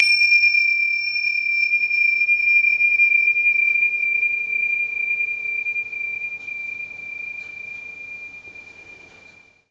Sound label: tingsha